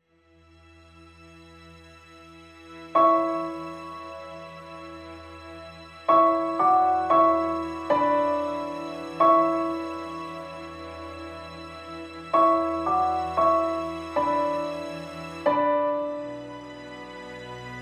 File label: piano